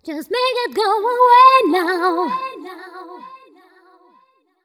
f mel f, fem Vocals

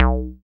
Bass SH-101

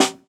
Snare, jungle breaks drum sounds

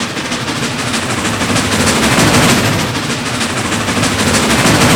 ACID TRAIN 194; D LOOPS; MIXED D LOOP

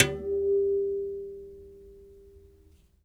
Tar, One Shots, World Percussion; Sony CS

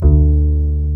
WOOD P-L, WOOD BASS; East West - Global Sounds

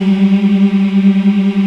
African Mm m; Roland LCDP11 Africa VOL-1

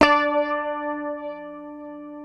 PICKED, Bass, D4; Sampleheads; Will Lee Bass Library

